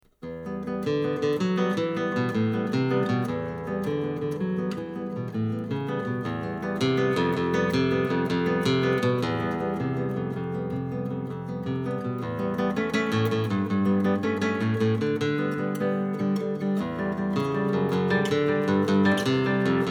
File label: classical guitar; Brouwer